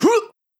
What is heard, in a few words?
combo, shaman, bell